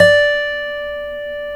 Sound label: STEEL D, FOLK STEEL Guitars; Spectrasonics; Hans Zimmer Guitars VOL-1